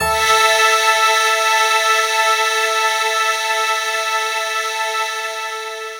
Dream Pad 5th

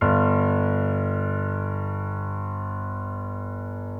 PNO XYPNO, Grand Pno, Roland

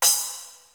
Splash, Percussion; Giga Samples Collection; ELQ-DrumQit; rock toms

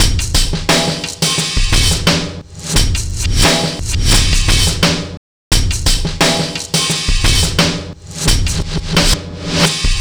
Beat, Drums, Eclectic Electronica

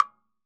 Timbaleta Jam Block; Best Service; Festa Latina VOL-1; Single-Hits

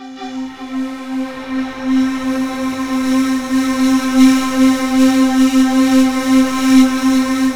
UNDULATIONS; Rubber Chicken Software Sample Bank; CYPERPUNK 2

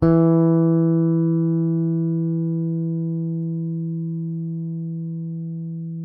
YAM FING E 3, Bass; Masterbits CLIMAX 7; PATITUCCI BS

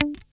GUI D4, E-GUI MUTED; Sound & Vision; Gigapack I CD 2

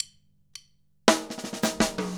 COUNTFILL TR, 165 TRIPLETS; Steve Gadd Drumscores

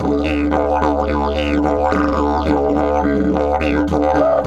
108, Didgeridoo

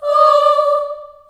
D4 child oh, Choir; Acoustic & Synth; USB Soundscan vol.28; AKAI; CHILD OHS

